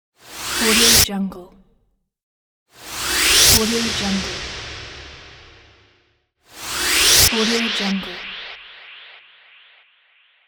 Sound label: short whoosh